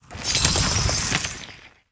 Azerite Medium Underlay Attack

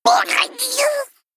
Male Critter Death

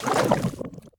Ink Underlay Death

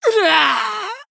Female Night Elf Death

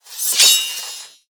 Glaive Epic Underlay Attack

